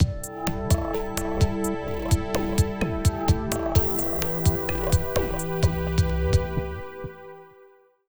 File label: jingle, 128 bpm